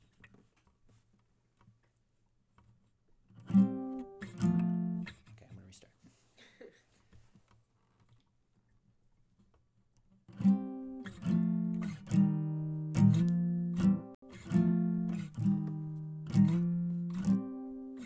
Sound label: guitar acoustic